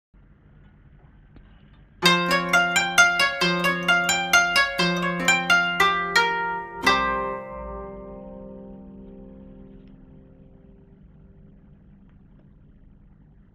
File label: koto